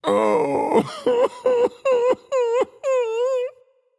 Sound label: boohoo; Smite; atlas